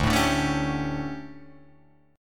D Major 7th Suspended 4th Guitar Drop D, chord

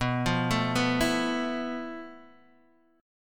F Major 7th Flat 5th B, guitar chord

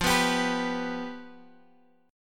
F Suspended 2nd Flat 5th, Guitar, Drop D

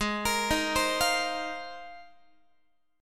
G sharp 6th Add 9th G sharp Guitar; arpeggiated; JGuitar

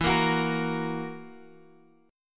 E Suspended 2nd Flat 5th E down Guitar Drop D

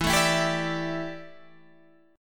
D Suspended 2nd Suspended 4th E

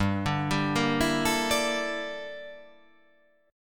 G Minor 6th Add 9th G down

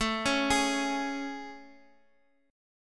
A Major 7th A, Guitar, Open F; AM7 arpeggiated; x,x,x,4,4,3; JGuitar

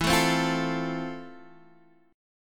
F Major

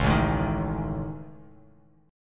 A Major Flat 5th, Bass Standard; strummed

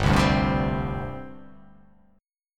G Suspended 4th G down, Gsus4 chord; strummed; JGuitar

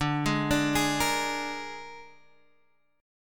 Bb 7th D, chord

